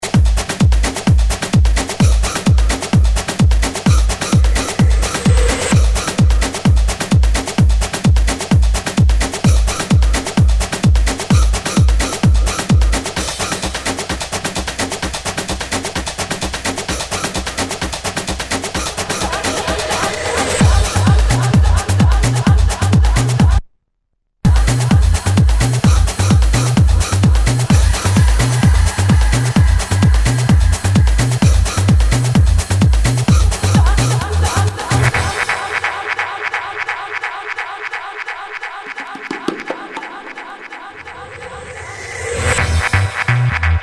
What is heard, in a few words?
Dance